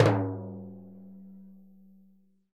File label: TIMB LO, Synclavier Percussion, World & Orchestral; ILIO; Synclavier Percussion 2